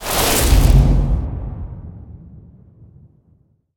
death portal open; smite; hera

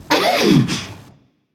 notice; smite; voicepack; medusa

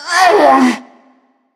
ugh; smite; neith

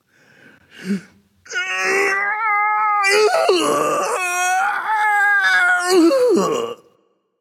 grunt lift; smite; kukulkan

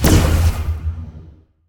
Projectile; smite; horus

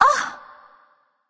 surprised; smite; nox

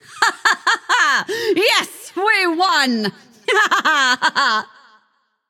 yay; smite; voicepack; medusa

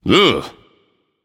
nv ugh; smite; achilles

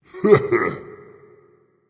heh heh, voice; Smite; ymir skin6

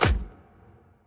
footstep; smite; arthur skin9

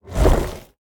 swing; smite; geb

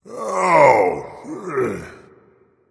ouch, voice; Smite; ymir skin6

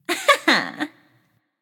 heh heh; discordia

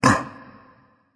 grunt lift; smite; sobek

